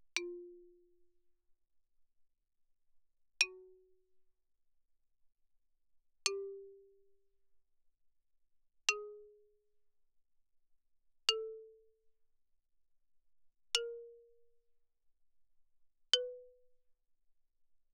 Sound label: xylophone rosewood ff F4 B4; Percussion